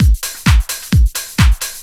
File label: drum 130 trance; Techno Trance Essentials; DRUMLOOPS